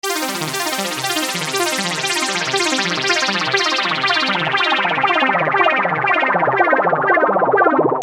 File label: Arpeggio 120 C, Techno Synth Loops; 120bpm; Sonic Foundry (Sony Creative Software); Signals I